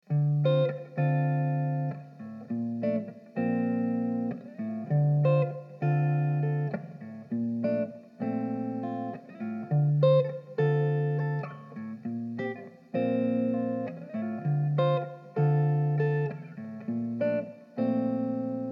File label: Dm100 Jazzy GTR chords